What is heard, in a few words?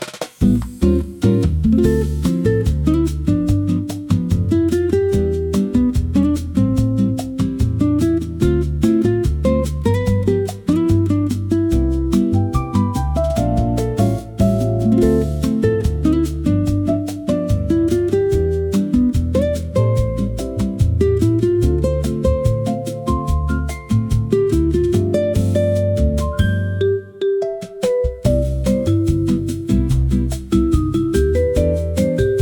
bossa nova acoustic for bright afternoon